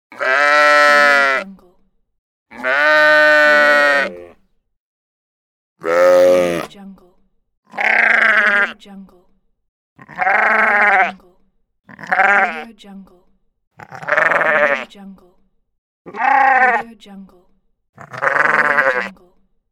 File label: sheep bah